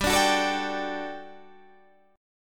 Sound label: G Major 7th Suspended 2nd G down